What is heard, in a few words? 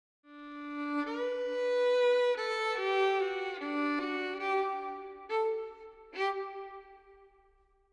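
harmonium 3-4 70 verb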